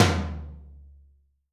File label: TOM, DRUMKIT; Best Service; Hyper Dance